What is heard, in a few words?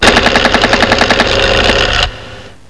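sewing machine; freeciv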